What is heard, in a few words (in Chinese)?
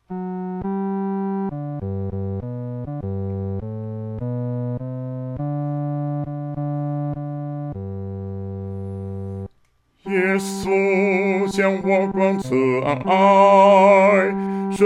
独唱 第四声; 主爱说不尽